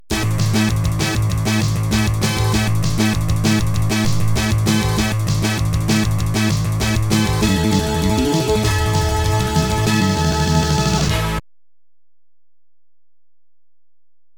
Unknown Jingle; jurassic park data east pinball soundtrack

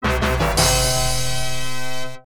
Game Start; Plus Alpha; Mega System 1; arcade

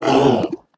Fishmen Injure; We Are the Dwarves

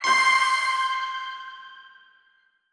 Title Sting; escape academy

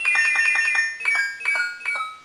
Heal Block

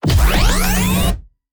Short Mutation

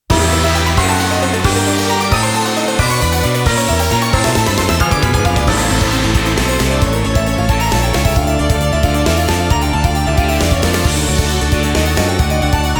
Battle Vampire loop; otome the exorcist

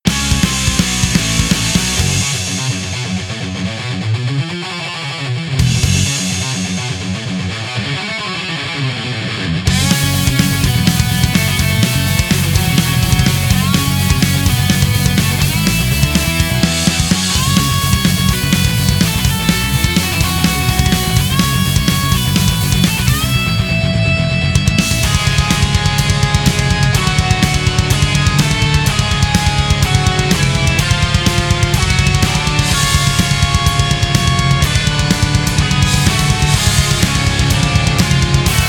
Boss Metal

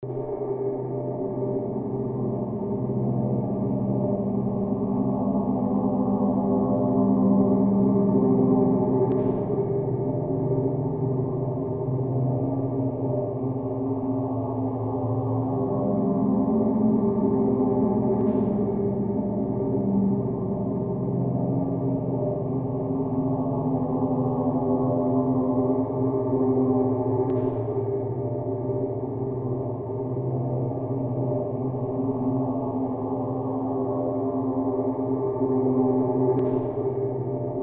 Scary Ambient Background; Riddle School 3

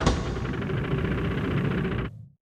spikes appear and charge

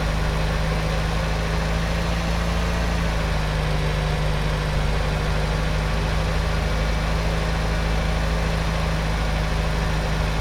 Truck Loop

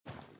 run sand

